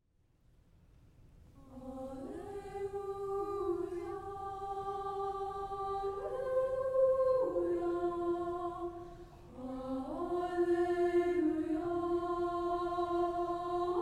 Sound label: ukrainian alleluia, choir